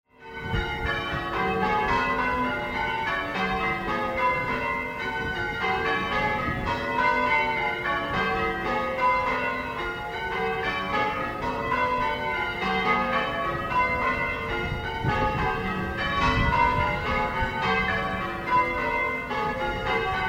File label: Bardwell Superlative, bells